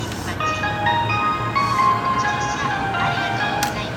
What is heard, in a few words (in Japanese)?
JR京葉線二俣新町東, train sounds